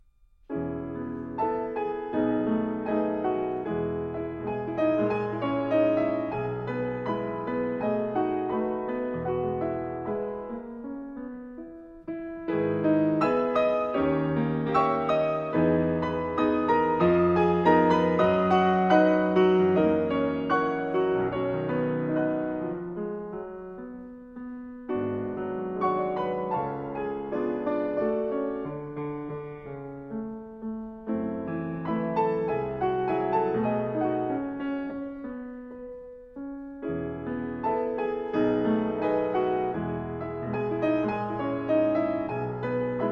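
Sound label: Etude No 5 in E Major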